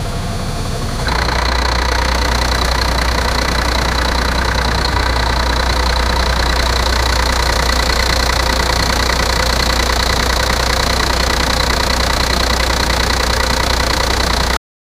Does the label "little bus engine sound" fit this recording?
Yes